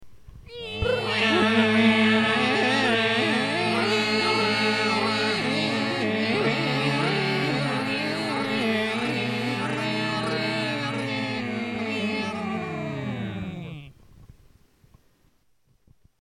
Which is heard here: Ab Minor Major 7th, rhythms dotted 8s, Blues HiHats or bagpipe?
bagpipe